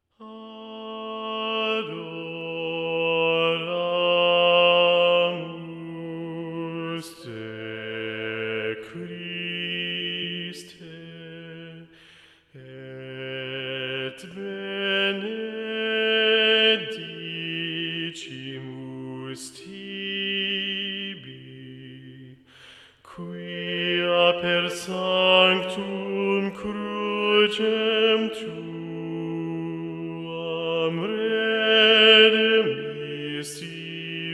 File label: Adoramus te B, choir tracks; Palestrina